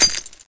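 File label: gls, impact debris glass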